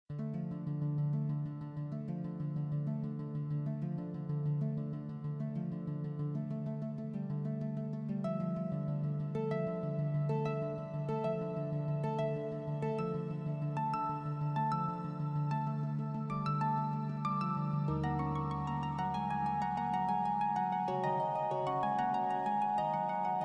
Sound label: Enchanted Harp